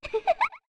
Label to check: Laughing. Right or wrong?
Right